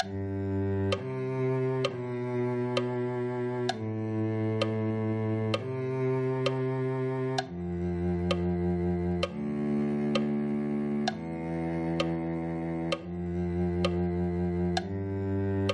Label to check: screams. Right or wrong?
Wrong